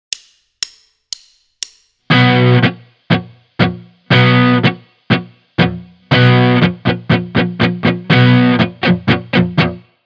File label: dead strings, Rhythm Guitar; Basic Rhythm Guitar II - "rock it!"